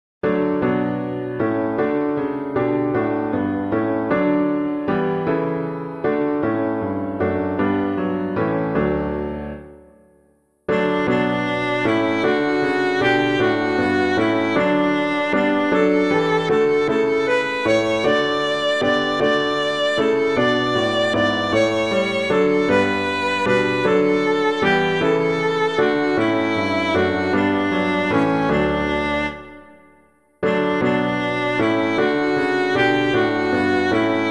The Virtue of Humility, PUER NOBIS, piano, hymn

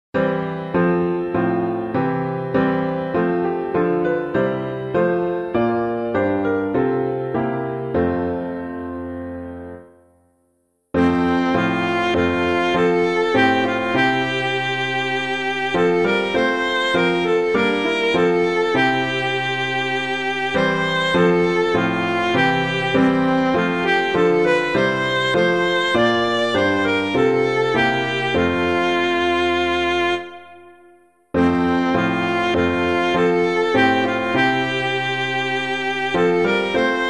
piano; Are You the Coming One; Stuempfle Jr.; SAINT THOMAS; Advent

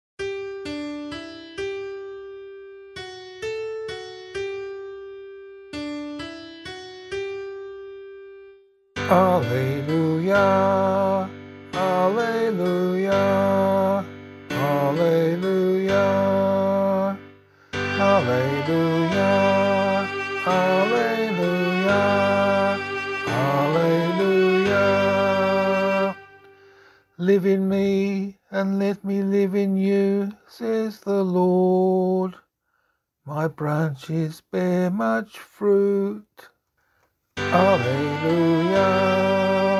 Gospel, vocal

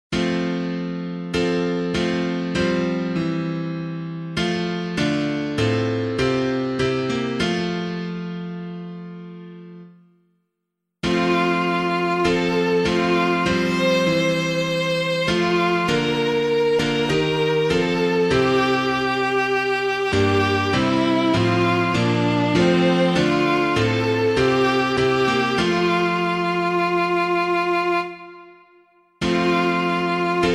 piano

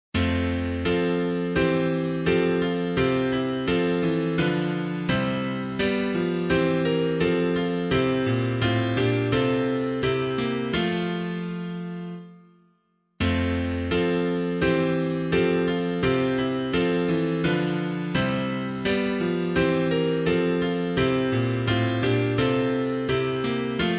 piano

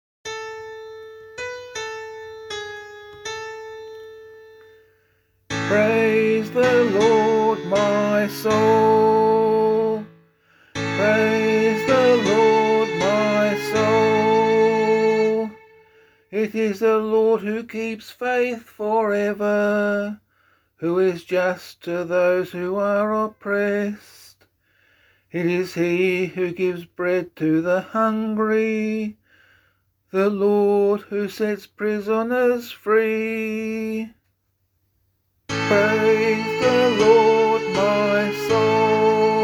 Psalm, vocal; Grail

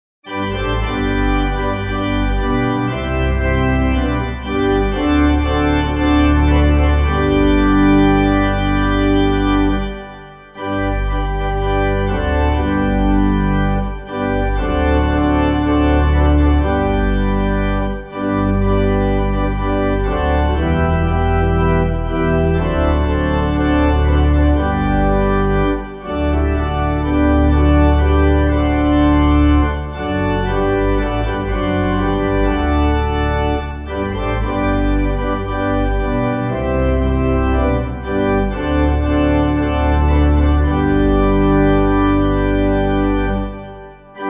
organ, hymn; BEALOTH; Clyde McLennan

